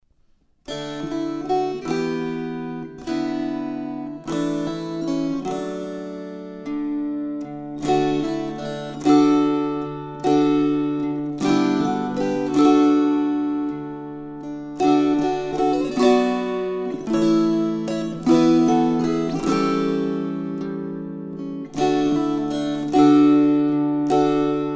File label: String Choir